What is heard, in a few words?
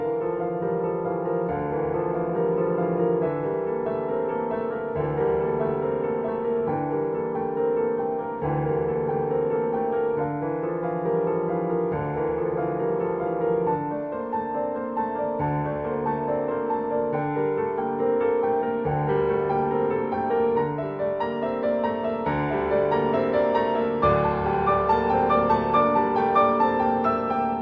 Piano Collections